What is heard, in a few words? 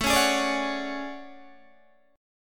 A Major 13th, Guitar DADGAD